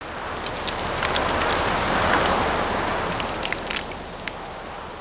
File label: Tree Crack Snow